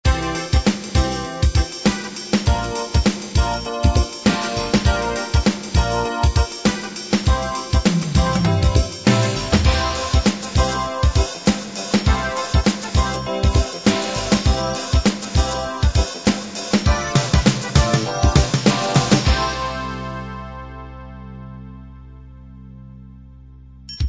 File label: smooth jazz